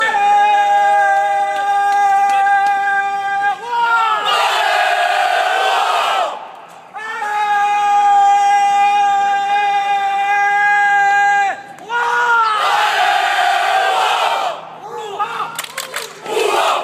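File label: gooooo rouennnnnnn, football chant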